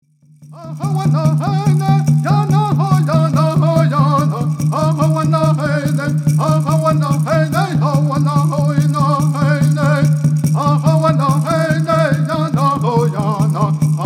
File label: Native American Church Song